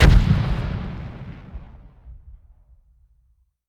Medium Explosion; dfMG Signature Series - Xplosions

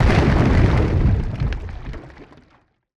Explotion

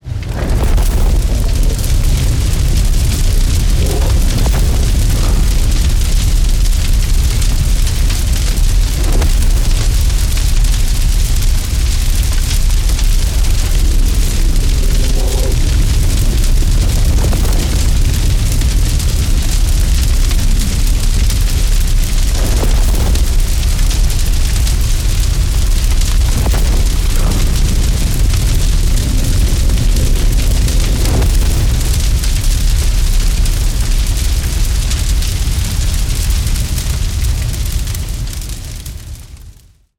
Forest Fire Wildfire; Unrealsfx - Extreme Climate

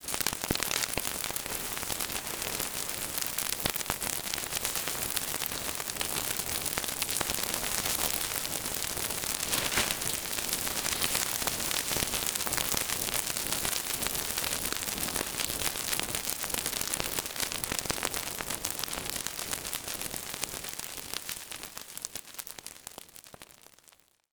Designed Fire - Flames Sizzling Crackling Popping; Gregor Quendel

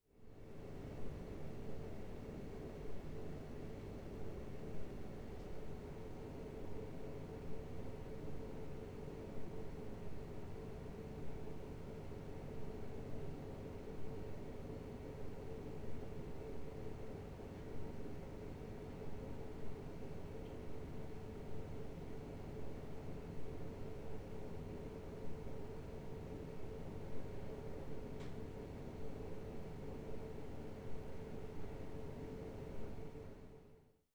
Calm City Livingroom Room Tone; Sonophile Audio

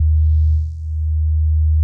Tele Reading Loop

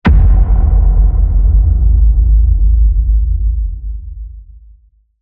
Ghost Impact Throbbing Sub Bass; BOOM Library - Booms Wows

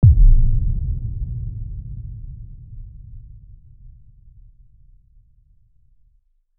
Designed Motion Deep Impact; Artlist Original

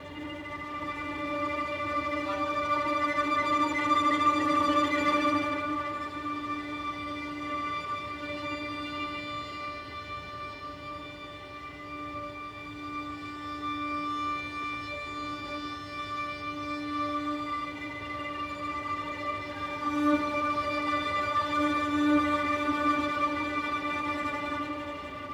Background Tension Builder; Artlist Original - Carmel Quartet

